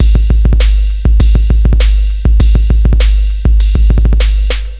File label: drloop, drumloops, Vinyl Attack; Masterbits